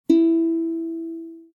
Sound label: uku e, accordeur